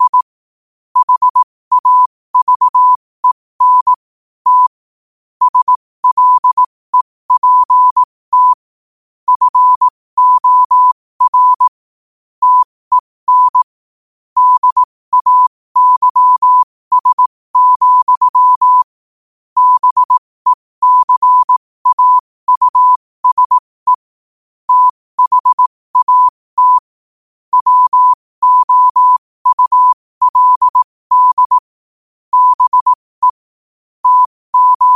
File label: 5 wpm, morse